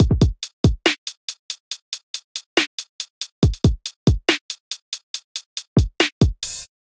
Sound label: Kit, Trap Drums; Solstice Kit; Ableton; Factory Packs; Trap Drums by Sound Oracle